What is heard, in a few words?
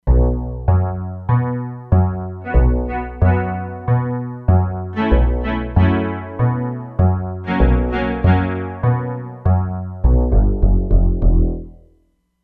Chorus, E-mu Proteus-3; Factory patches; Basov Chorus